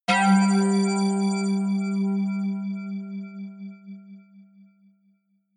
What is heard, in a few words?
synth hit pulsate high